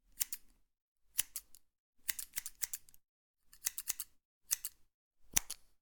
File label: Scissor